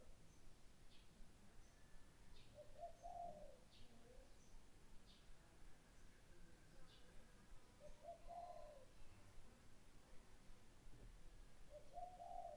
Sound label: nyepi morning garden